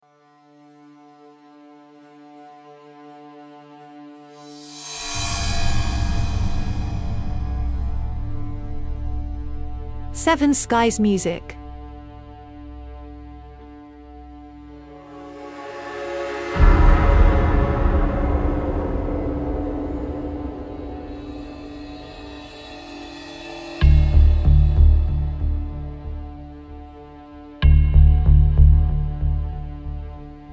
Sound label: Ambient Suspense Inner voice